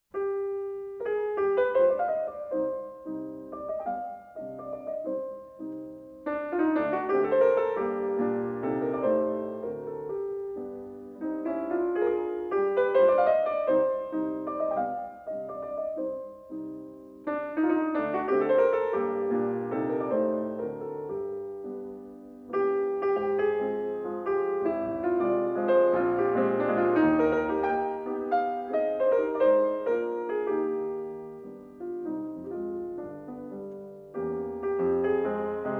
Mazurka No.18 in C minor, Op.30 No.1, Chopin Piano